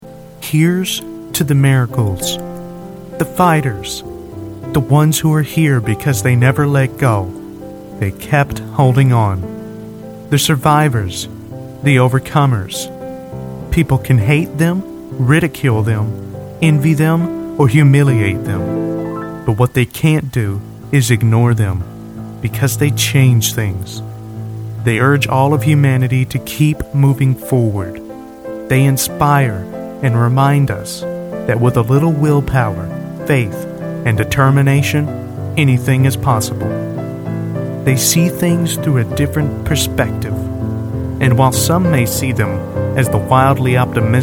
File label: Background music piano cover